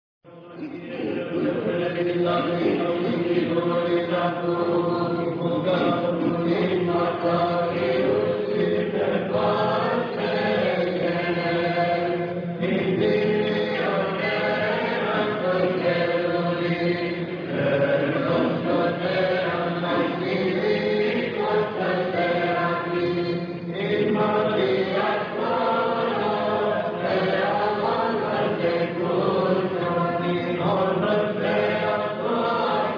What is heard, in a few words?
Choir